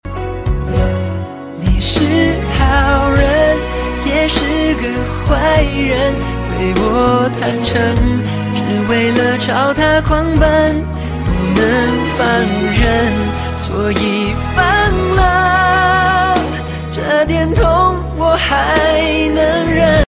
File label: Chinese Song